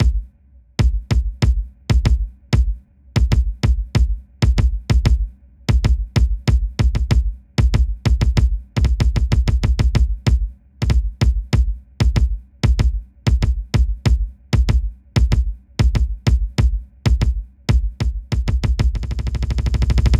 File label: Secondary Kick (double); super crush k.o.